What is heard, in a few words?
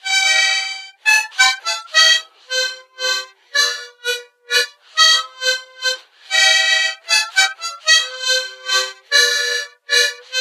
harmonica